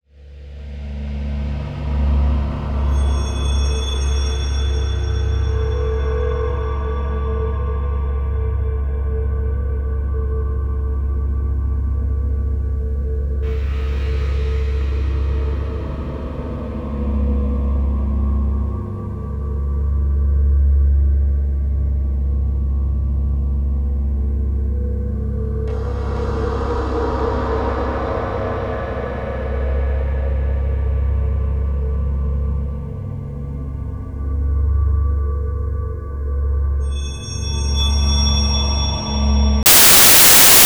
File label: ambience; Bluezone Corporation; KOSMONAUT; CINEMATIC